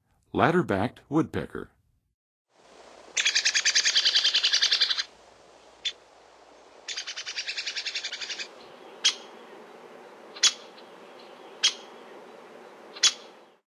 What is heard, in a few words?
Ladder-backed Woodpecker, Songs of Birds; North America; Western USA; Western USA Songbirds